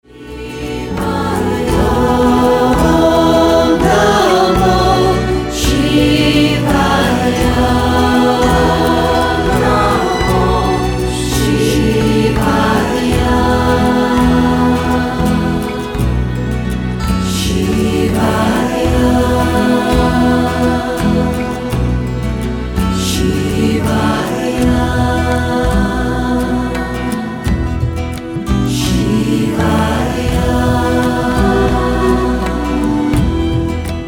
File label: Shivaya Om Namo, Cosmic Kirtan